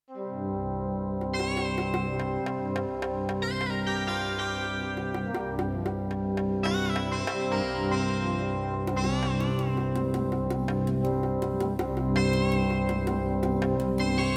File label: CM500 GS; LSL3